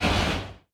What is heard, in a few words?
distance arc emitter fire, weapons; Stellaris